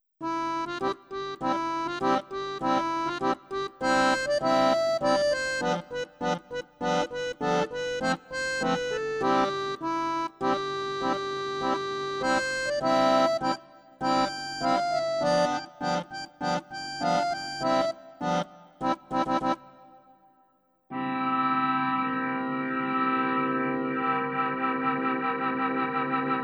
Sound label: harmonica roh